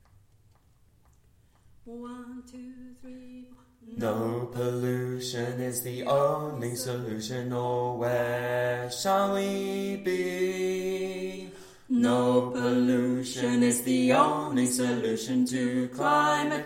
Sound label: TENOR BASS